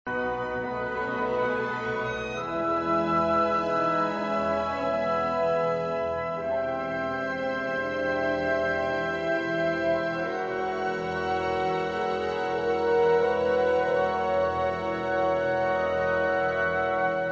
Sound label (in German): Rundfunkorchester Symphonie E-Dur; Hans Rott; Symphonie Nr. 1; II Sehr langsa